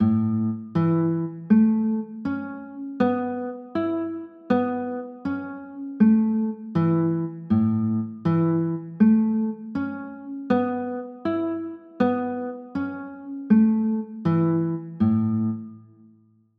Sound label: a add9, chords